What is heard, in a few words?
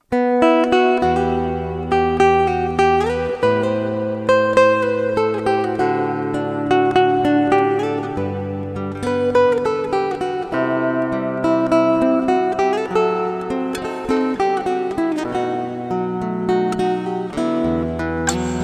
Silent Guitar Reverb